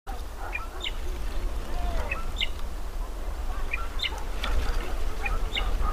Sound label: Shrike, bird songs